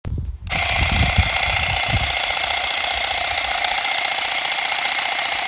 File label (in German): geraeusch